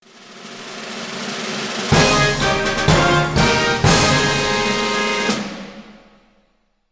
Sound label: game win; FantasyTennis